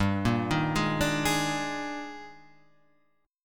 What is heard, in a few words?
D sharp Major 11th, G, guitar chord